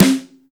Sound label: steel snare drum C key, snare drum sound tuned to the C note. Loudest frequency: 4099Hz